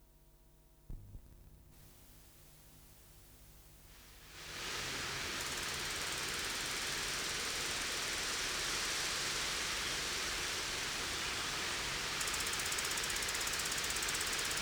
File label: Cicada orni